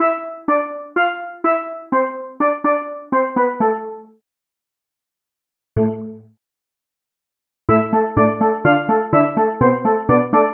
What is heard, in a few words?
steel drums